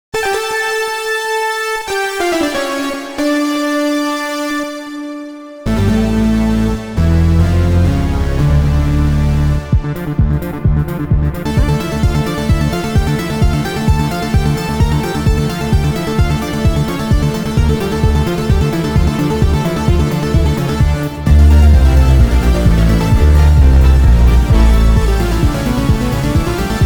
punk toccata

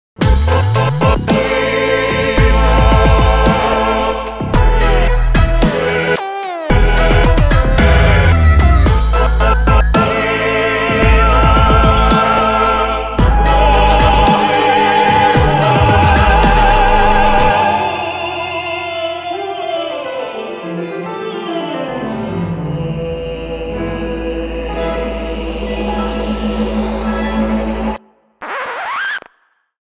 dubstep

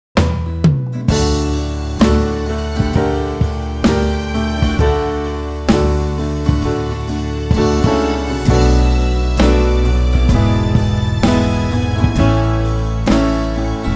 INSTRUMENTAL

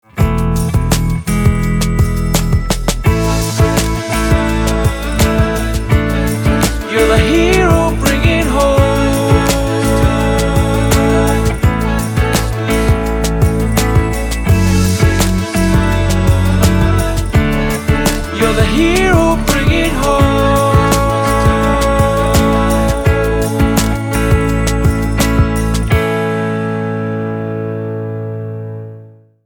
A HERO BRINGING HOPE (MALE VERSION)

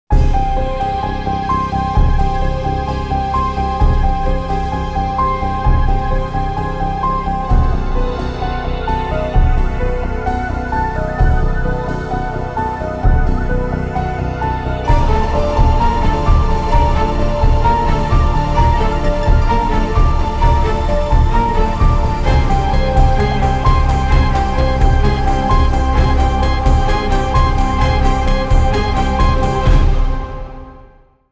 SPOOKY PIANO

